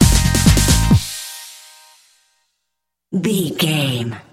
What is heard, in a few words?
work it out sting, Dance, EDM, Club, Exercise, Aerobic, Aerobics, Spinning, Workout, Disco, Eurodance, Confident, Energetic, Cheerful